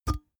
big can empty pick up hard

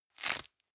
velcro open slow

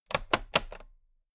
toolbox latch close heavy

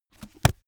heavy book shut

